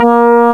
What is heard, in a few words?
GRAMER, FAIRLIGHT CMI IIX, ELECTKBD